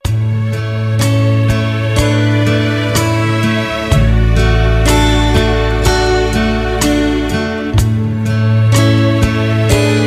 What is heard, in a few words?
Instrumental Two Step